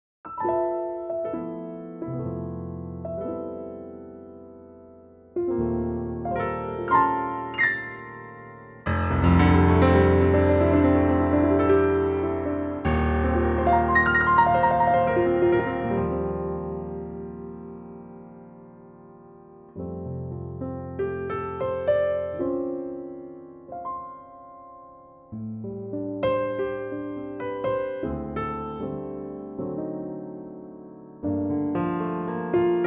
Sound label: F piano; Pianotrak; key - F - vocal range - C to E